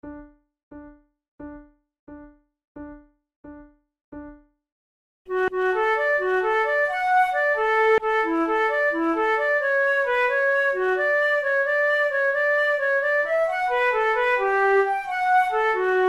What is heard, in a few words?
duet